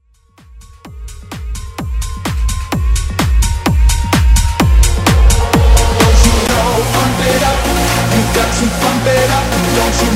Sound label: Techno Sound Edit